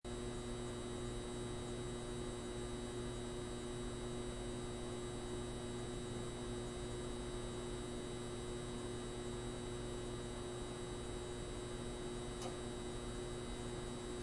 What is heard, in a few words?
factory fluorescent light buzz